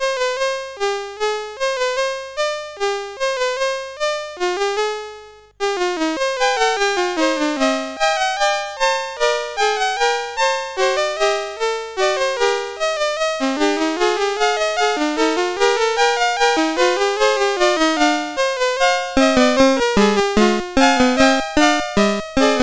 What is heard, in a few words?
Fugue No 1, CSG8580R5